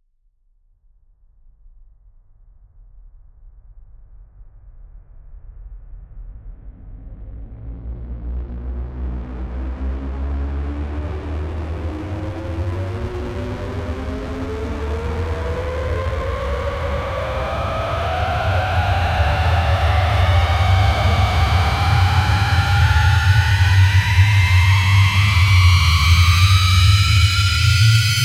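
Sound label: fast cinematic riser rapid; pulse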